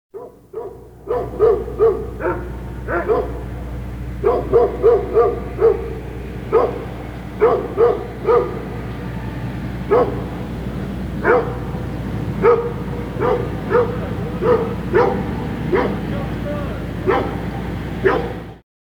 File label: Dog Barking In The Distance; Halloween Sound Effects